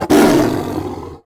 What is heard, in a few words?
roar